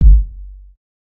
00s long tail reverb kick drum g key; kick drum samples

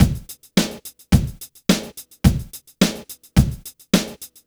107 bpm drum loop b key

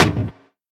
dark sounding snare single hit e key; snare drum samples

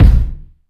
reverb kick drum one shot b key

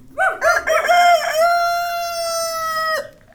small dog bark and rooster singing

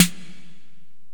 focused steel snare drum f key; snare drum samples

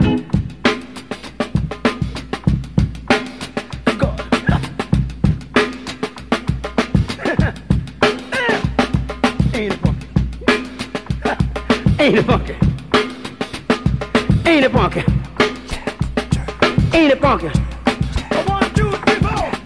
98 bpm modern drum loop sample b key

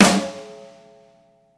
big snare one shot f sharp key

